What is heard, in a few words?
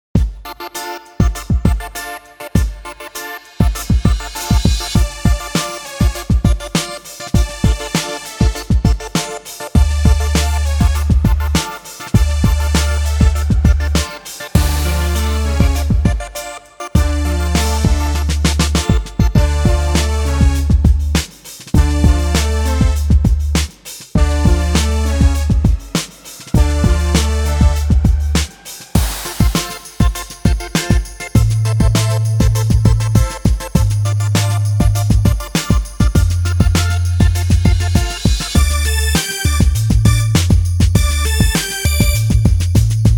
stock music